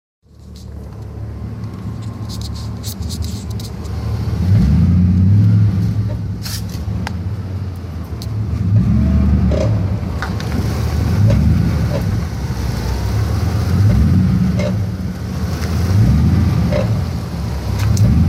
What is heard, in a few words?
noise rear slow turns bullfrog in my rear, gears axles